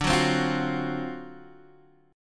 D 7th Sharp 9th, Guitar Open G

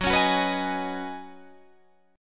Db 7th Suspended 2nd Suspended 4th Gb

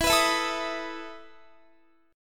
E Major 7th Suspended 4th E down Guitar

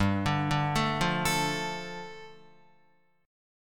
G 7th Suspended 2nd, Guitar; arpeggiated; JGuitar

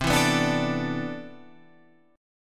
C Major 9th Add 13th, guitar chord

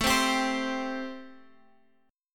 A-Minor 7th A Guitar; strummed; Am7

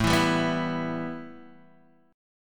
A 7th Suspended 2nd A, Guitar Open D; x,0,2,1,2,2

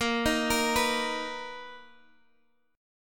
B Major 7th Bb

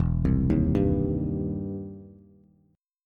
E Minor Major 7th Sharp 5th, E, down, Bass; EmM7#5 arpeggiated

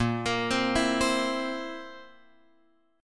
A sharp Suspended 2nd Flat 5th; A#sus2b5 Chord {6 X 8 5 5 8} arpeggiated

